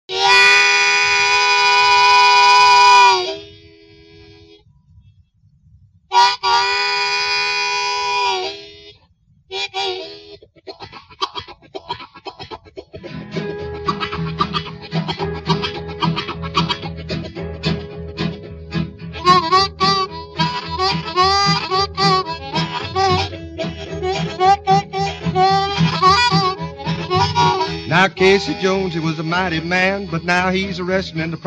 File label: freight boogie